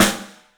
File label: STEEL S, drums; 3D Sound